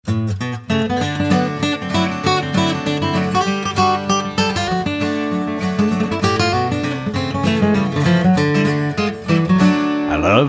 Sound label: acoustic; nashville songwriter samples